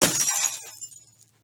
Glass Break; Treasure of Nadia

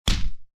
punch; The Witcher Hunt

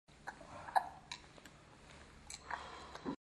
suck, moans; The Witcher Hunt

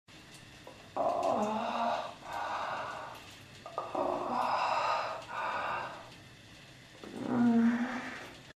moans; The Witcher Hunt

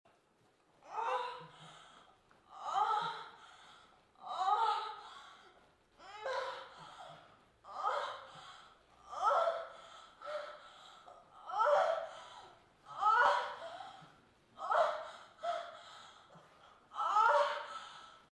cum, moans; The Witcher Hunt

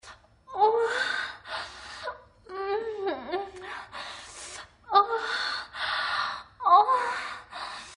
sex; Low Blow

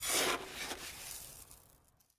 Shovel; Treasure of Nadia

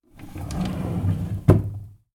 close drawer; Treasure of Nadia

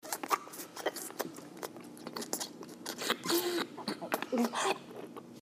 throat, moans; The Witcher Hunt

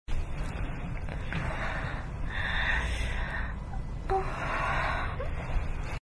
moan, moans; The Witcher Hunt

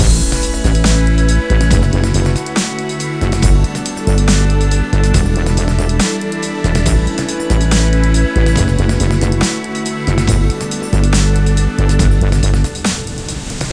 bg space; Sex Traveler Comic Convention

